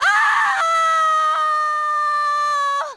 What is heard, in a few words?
scream fall